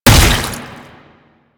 Proto Infested Babau Underground Attack Tentacle Slam, Enemies